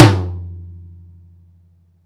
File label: TOM, Drum Dry; East Collexion; Drum 1